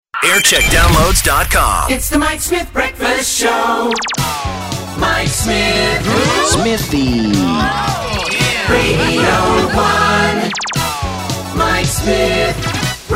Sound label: Jingles; Radio 1; Mike Smith